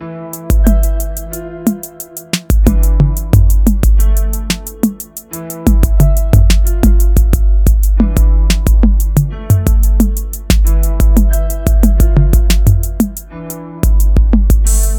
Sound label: beat